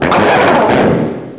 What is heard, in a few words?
cry